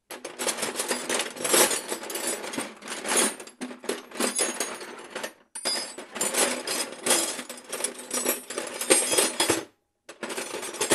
yashik s instrumentami

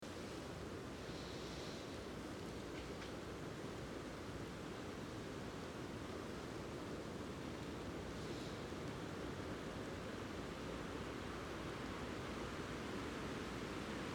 veter v gorodskom parke zimoi

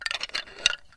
zvuk skeleta

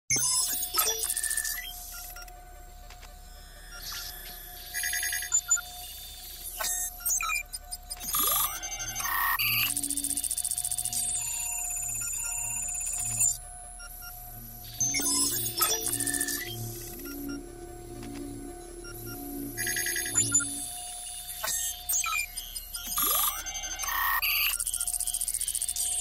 effekt gologrammy

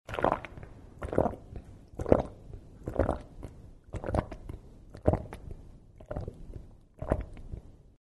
zvuk pitya vody glotaniya

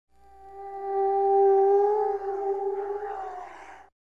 prizrak

